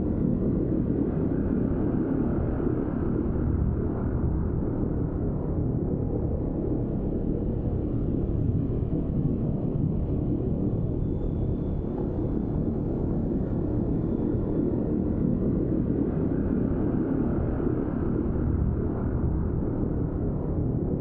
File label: ship cruiser idle; Stellaris; machineage; ships